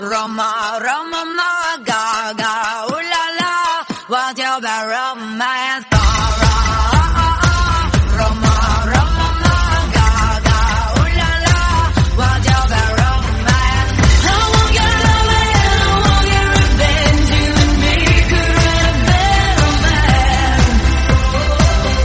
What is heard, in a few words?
English Song